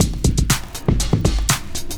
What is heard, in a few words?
LOOP, 70's Breakbeats; USB Soundscan vol.46; AKAI